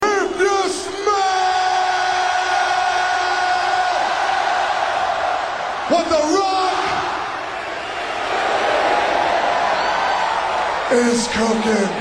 jazz rock

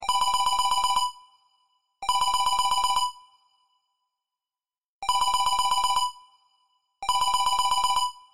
x 6 low ring; ringtones; sms

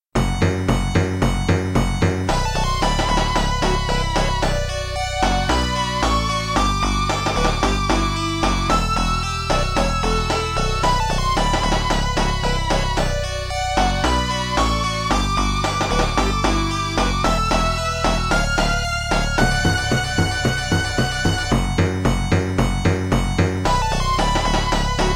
boss theme; sonic

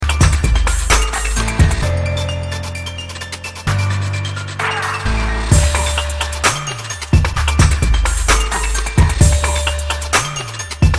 hiphop

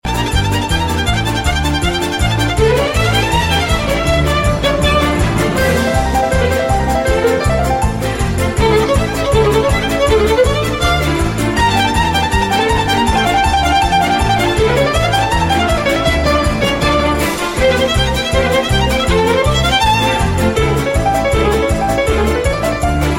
Piano Vs Red Violin

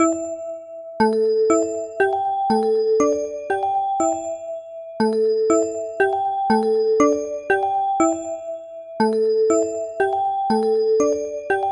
Bells Ringtone; Mantis

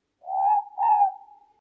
morepork

